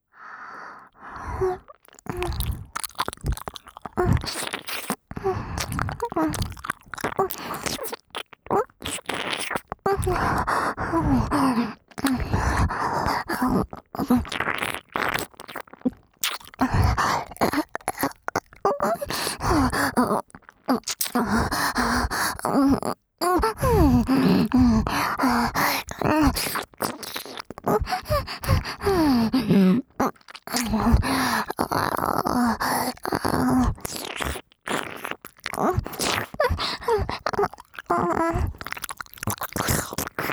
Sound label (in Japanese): 唾液増々耳奥舐めしゃぶり＋本気喘ぎ